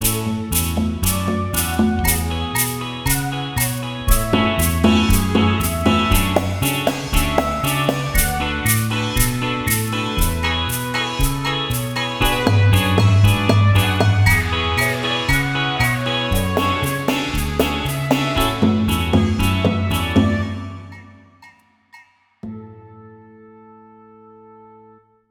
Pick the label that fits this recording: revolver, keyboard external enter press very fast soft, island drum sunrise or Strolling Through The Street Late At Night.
island drum sunrise